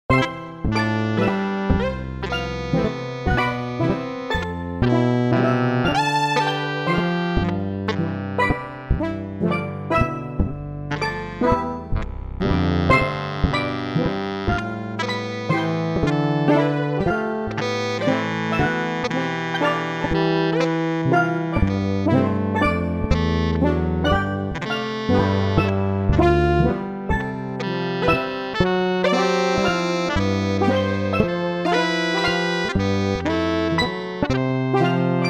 woozy drowsy

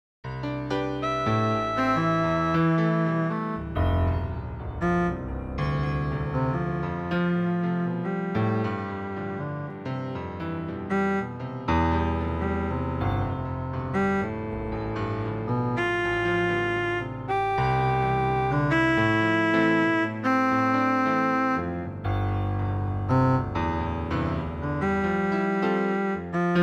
Endless double cello sonata movement; fractal music; six eight

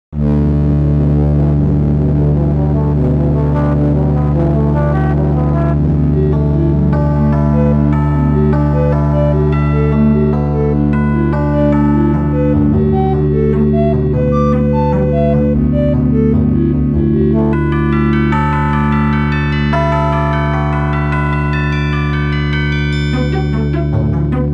Slendro scale with modulo arithmetic seeds; fractal music; Multiple seeds

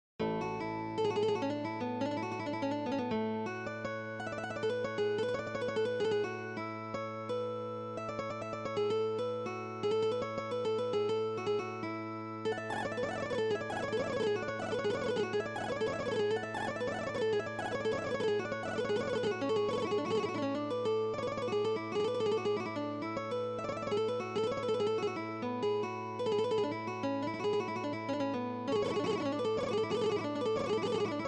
long melody

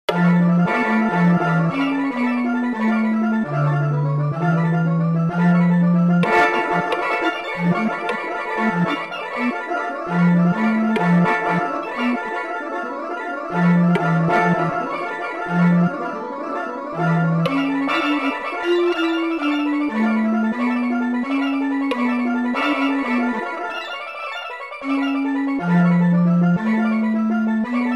hawaian scale; fractal music; Sloth canons

